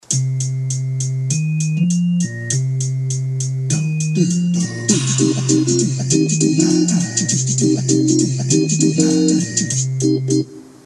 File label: funky; C Blues / C Pentatonic kinda feel; basic lead line